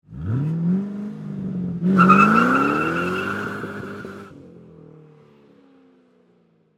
Ford Mustang, Drive, Away, Tire, Squeal, Fast; Transportation, Automobile; Car; BlastWave

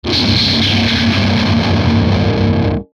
Descend Musical Delay Pulses; Descending Sound Effects; Blastwave

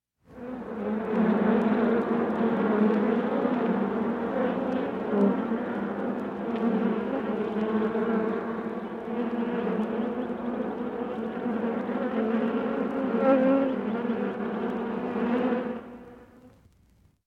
Swarm Of Bees

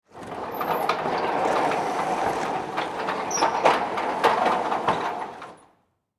Industry Warehouse Door Open, garage door; Garage Doors

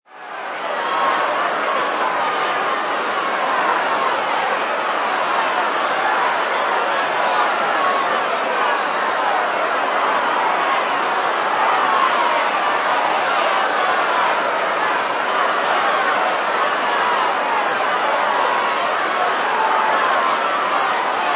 Sports Crowd Boxing Match Large

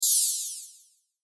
burst delay; Buttons; Multimedia

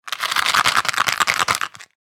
household matchbox shake; Matchbox Being Shaken; Kitchen

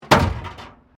METAL BIN IMPACT PLASTIC CRASH BARRIER

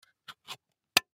Tin Case Lid On, Tin Case Lid On Mint Candy; Foley; Blastwave

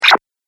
Squelchy Jump Sound; Cartoon Sound Design Sound Effects

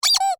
Squeaky Toy Single; Foley; Miscellaneous Toy (Sound Effects:Toys); BlastWave

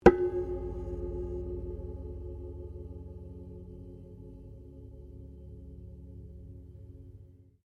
ZITHER HIT, Very old wooden zither or autoharp; String Sound Effects; Strings (Sound Effects:Musical)

